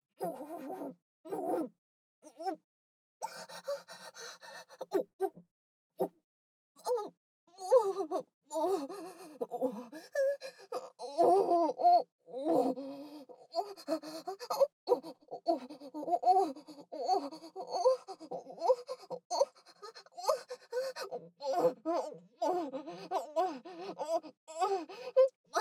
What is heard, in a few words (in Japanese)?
バック; アドリブ集